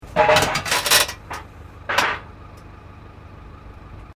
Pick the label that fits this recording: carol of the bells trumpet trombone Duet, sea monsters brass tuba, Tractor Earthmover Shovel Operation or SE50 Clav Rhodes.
Tractor Earthmover Shovel Operation